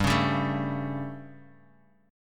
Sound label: G Suspended 2nd Flat 5th G, Guitar Open D; chordshape; down